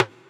Conga; Equipped Music; Loungin House

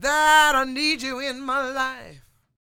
SING, Vocal Planet Blues Soul BLUESY

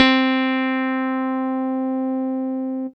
C3 e-piano

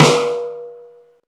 snare; Zero-G; Chemical Beats; SNARE ZOO

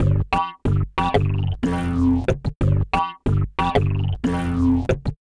Funk Riff

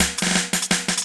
LOOP SD; Ueberschall; Drum & Bass Resonance

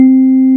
MS-20 VIBE; East West - Global Sounds

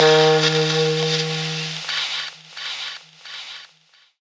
SAXOF; Rawcutz - Nu Forms

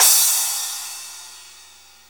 CRASH, Dance Mega Drums; Best Service; CRASHES

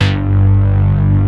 Prophet Five

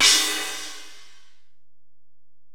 Ride, Alesis DM-PRO

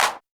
Roland Juno D 808 & 909 Kit CLAP

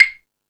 Latin Layers, Drum Machines E-MU Pro-Cussion

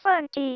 Female vocals and robo-vox

